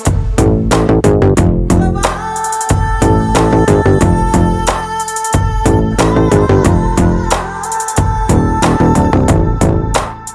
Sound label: BG Tone